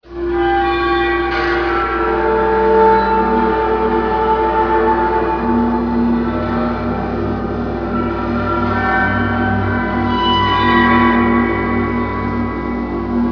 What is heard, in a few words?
plate